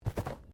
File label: Body movement Slide